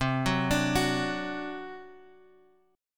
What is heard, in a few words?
C Suspended 2nd Flat 5th C; Csus2b5 Chord {X 3 4 X 3 2} arpeggiated